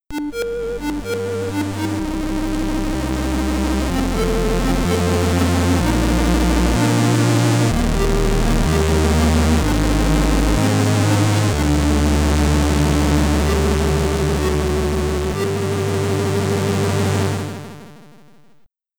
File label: Game Over (SID Stereo); quadrant commodore 64